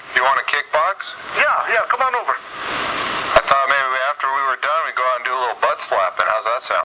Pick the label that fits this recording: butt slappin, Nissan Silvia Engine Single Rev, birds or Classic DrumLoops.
butt slappin